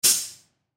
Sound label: Fire Truck Air, Fire Truck Air Brake Release Air; Emergency; Emergency Vehicles